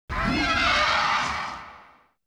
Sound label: Dragonzord Sound Effects; Saban; MMPR